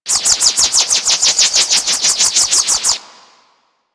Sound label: Missile Platform Projectile Loop; Grn Missile Platform